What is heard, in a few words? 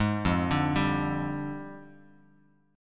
F Minor 6th Add 9th F down Bass Standard; JGuitar